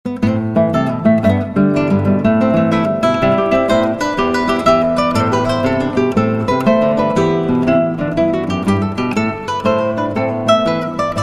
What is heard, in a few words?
jig